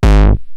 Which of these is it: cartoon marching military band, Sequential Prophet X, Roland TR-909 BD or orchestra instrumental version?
Roland TR-909 BD